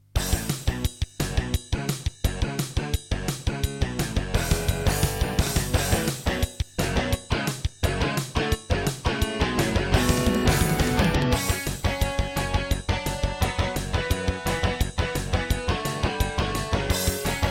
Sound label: Intro, Aztech Sound Galaxy Wave Power; Duke Nukem 3D